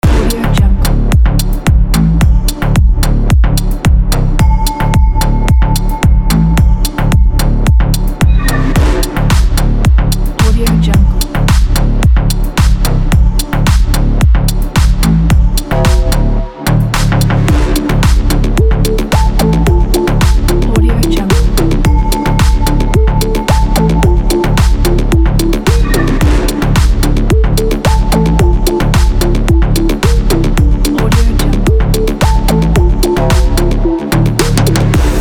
tech slap tune

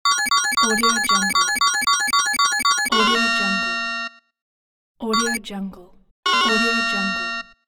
slot machine counting

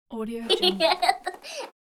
laughing baby

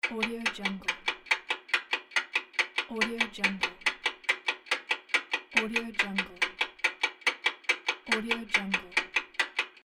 clock ticking

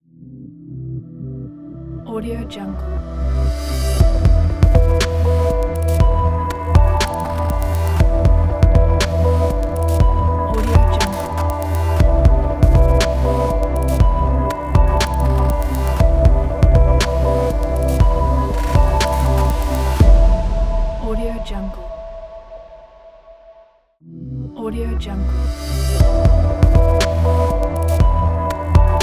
digital minimal modern logo

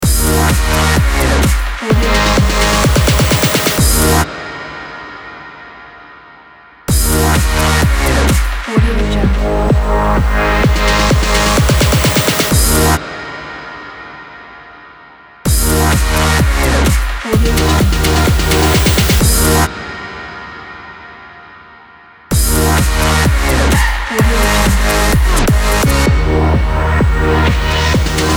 summer party logo

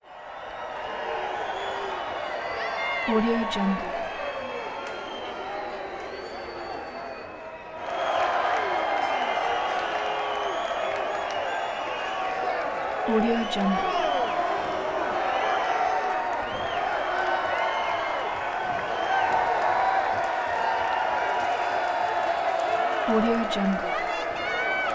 wrestling crowd huge mob chants you suck

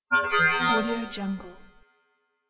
technologic computer alarm system

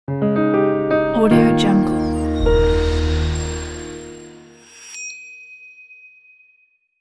coming to the end piano logo

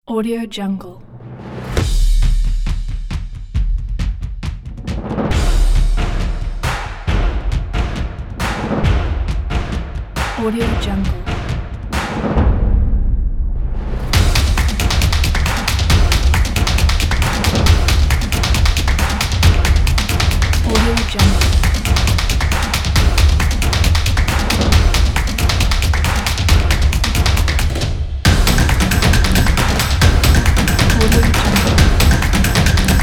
drumming; drums and guns

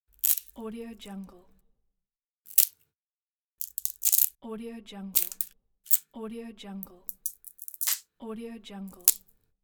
bamboo break

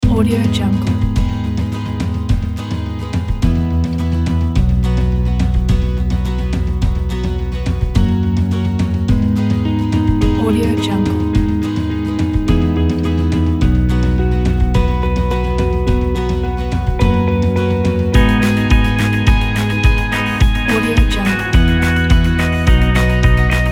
indie pop motivation; music waves production

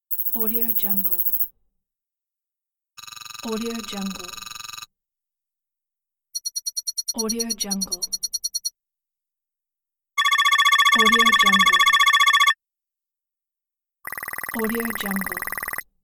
type location name; livingroomclassic

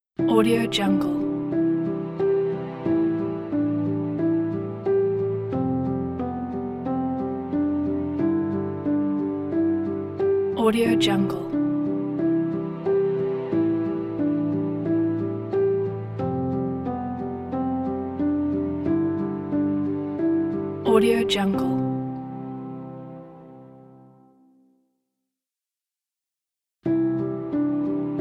piano logo

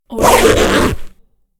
scratch

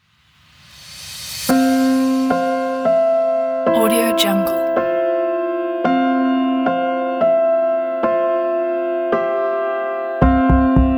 documentary

